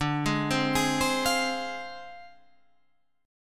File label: D Minor 7th Flat 5th Guitar Half Step Down